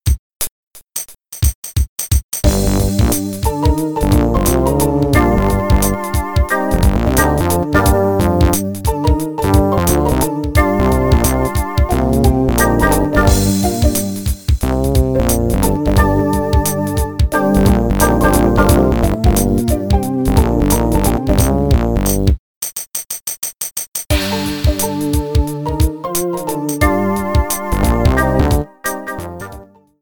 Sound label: Spooky Attic Menu